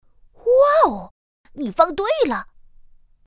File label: drag right; pinyin2